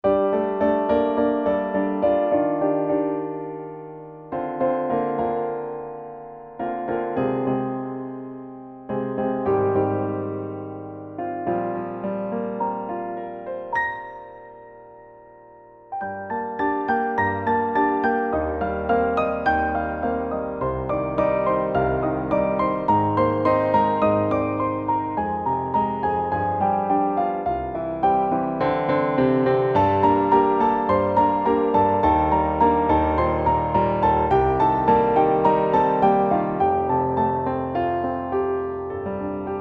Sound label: 105bpm